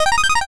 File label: arpeggio